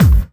BD Clubby; VEC1

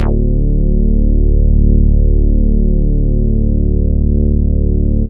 Bass Long D; VEC1; Club; Long Basses

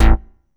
Bass A, Offbeat Bass; VEC1; Club

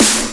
Snare; VEC1

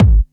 BD Trancy; VEC1

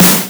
Snare; VEC1; Club

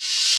Cymbal, Hip-Hop

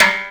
Rim, Hip-Hop, Drum Kit

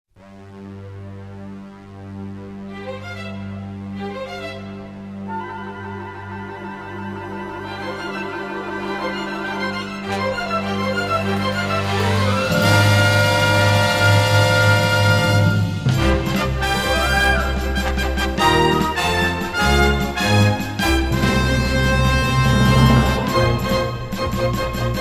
Music Box; Sailor Moon; Аниме

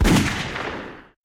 shoot

